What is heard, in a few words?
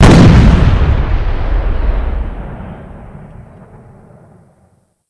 cannon; BRIT